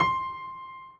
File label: piano